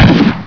SHOOT CUT; CHASM

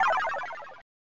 bonus; AMOS Music

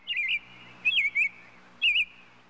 robin, ambients; Jack

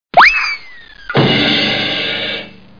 jump; How Many Bugs in a Box

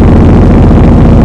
engine

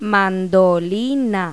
mandolin; Pipo-Aprende musica con Pipo

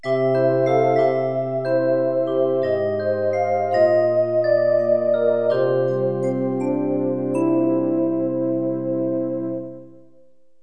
fanta; Roland; An Invitation To The Roland World Of Music

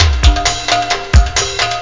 breakbeat; algomusic; rhythm

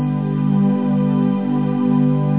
chord silk water strings, major; OctaMED SoundStudio V1

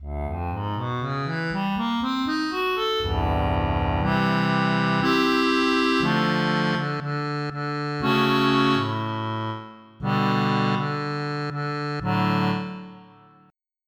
Accordian; EverQuest

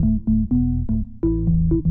ORGAN HOUSE 125 A; eJay; Keys

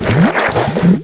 in lava; Hexen II; raven

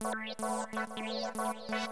SYNTH HOUSE 125 A; eJay; Keys

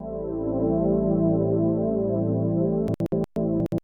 SYNTH PAD HOUSE 125 A; eJay; Sphere

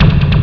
Beat; TechnoManiac 1.1

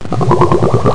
swirls & blubs; PUZMANIA

